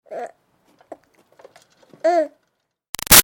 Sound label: Baby 6 Month Old Struggle Light; Babies